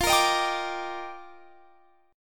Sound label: F 13th Suspended 4th; F13sus4; strummed